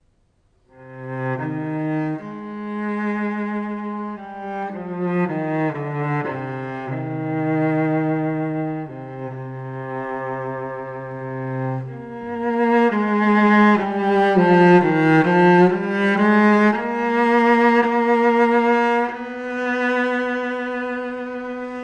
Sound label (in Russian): violonchel; N.Nevrev